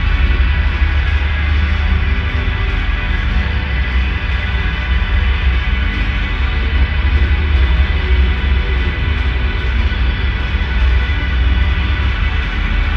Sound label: full mix wet with airspace, hybrid reverb and delay; modeaudio